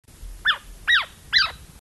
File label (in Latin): micrathene whitneyi